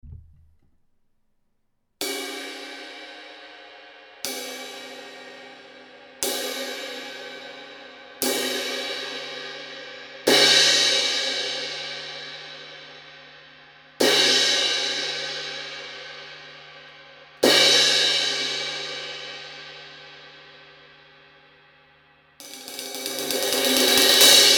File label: Crash; Amedia; Hitites